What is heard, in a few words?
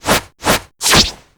charm